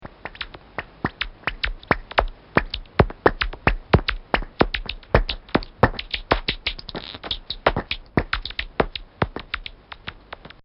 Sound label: Noctule, Bat; Bats; Animals; Bobby Cole